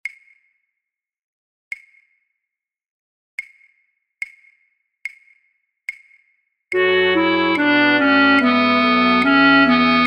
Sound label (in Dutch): Matthaus Passion fluitorkest Altfluit; voor oefentracks